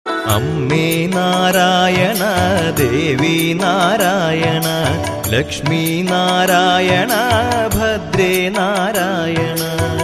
malayalam music tune ringtone

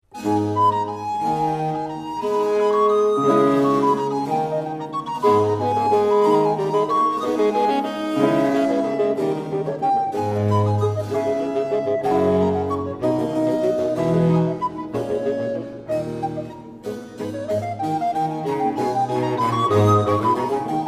classical sonata in a minor ringtone

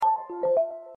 alert ringtone

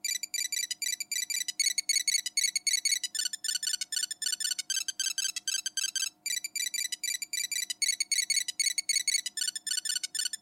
nokia ringtone

